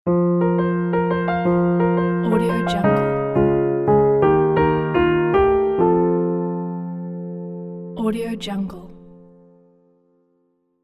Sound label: piano business logo